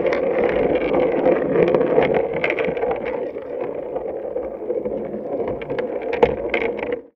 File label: In The Bath, Marbles, contact mic; musicradar; contact mic madness; Raw